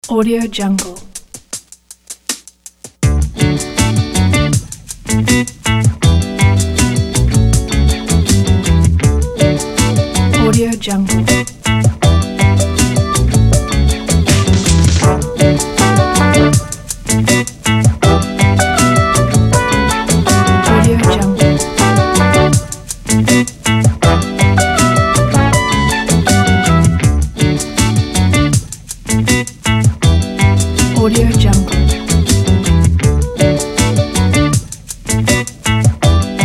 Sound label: upbeat feel good